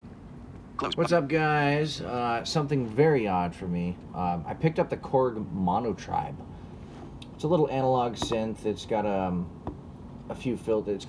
making vintage phone noises with the Korg Monotribe